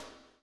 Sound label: COPS; Spectrasonics - Backbeat